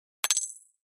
Pop Up HF Elect Tap Shi, pop up element

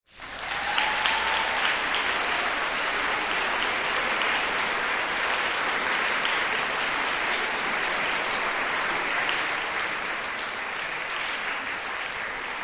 Audience Applause, crowds int

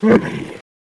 Camel Bluff On Exhale; camels

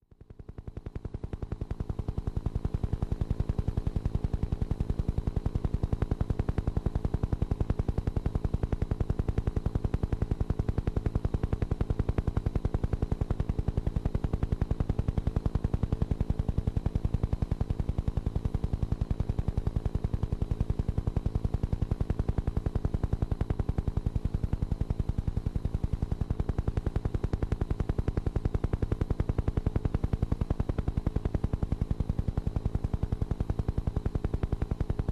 Uh1 Huey Interior Fli

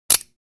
Free Weights Exerci

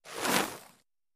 Arrow Bys Fire Sizzle; bow & arrow bys

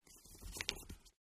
paper page turn; paper mvmt

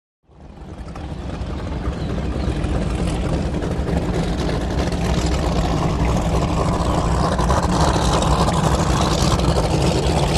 Power Boats Amb Bird, off shore power boat

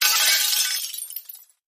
Glass Debris

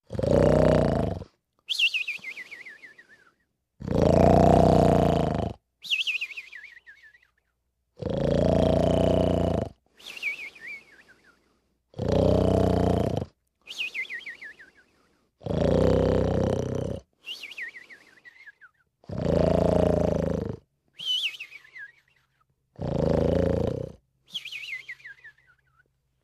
Comic Snor Whistli, coughing & spitting

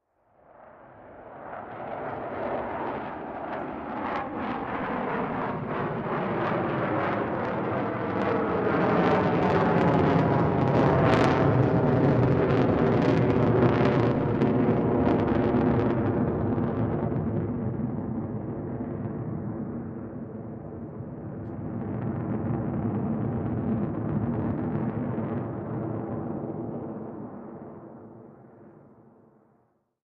F/A-18 flyby

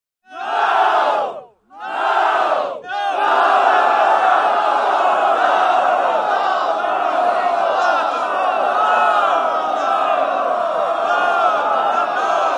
Infantry Roaors, infantry cheers

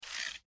Short Squeaky Mouse

